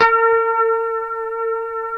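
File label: B 4 harmonic, guitar; Spectrasonics; Hans Zimmer Guitars VOL-1; 90's Sample CDs; HYPEMONICS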